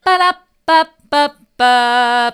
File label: bada bap, vocal